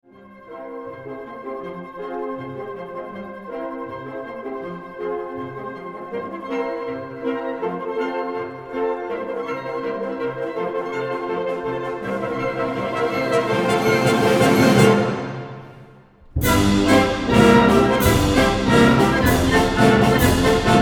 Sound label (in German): Ungarische Rhapsodien Nr2 cis moll